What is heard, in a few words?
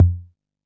Kick, Drum & Bass; AMG - Prototype Drum & Bass